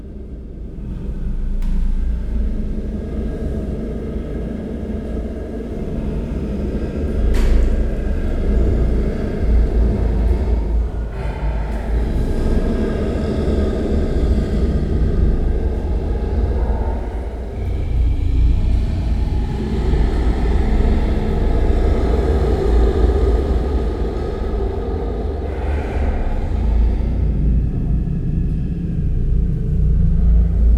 hörgő állat, bengáli tigris; pecsi zoo 2017

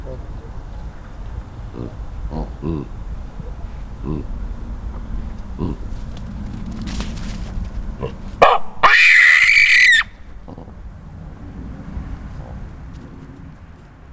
sikítás, szélvédő, gallérospávián; állathangok; nyíregyházi zoo 2010

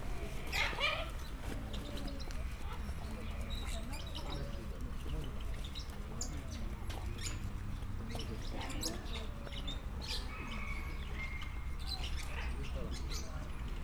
kacagó jancsi; állathangok; nyíregyházi zoo 2010

